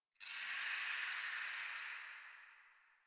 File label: Tree Distant Critters, Ambience; Props; Warframe; Duviri